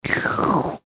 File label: human slurp